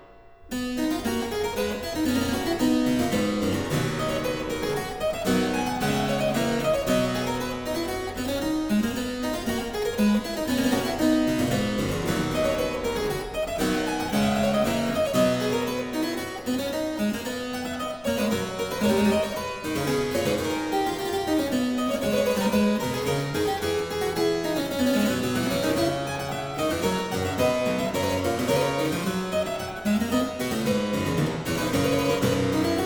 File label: Aria Variations in C Major, Harpsichord; More Palantino